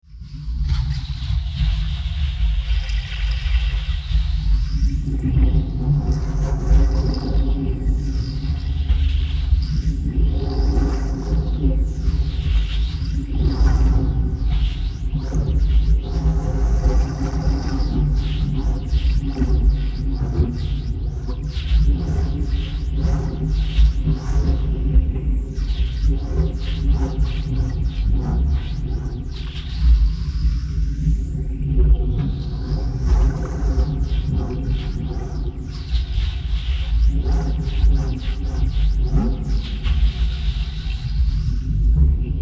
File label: Eerie Drone